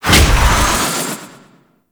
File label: Veilbreaker Jetpack Jump; Warframe; Vehicles